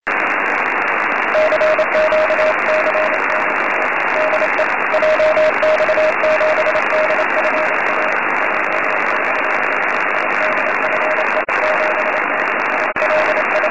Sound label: 6m cw; jx7dfa